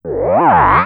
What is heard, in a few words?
TECHNO EXTRA 140